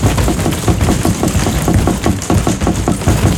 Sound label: Fumerol Cap Rattle Loop; moul